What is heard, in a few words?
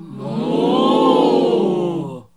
NOISE, CLASSIC VOX; Best Service; Hallelujah